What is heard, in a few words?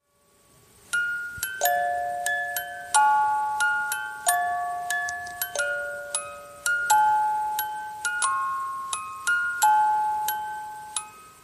music box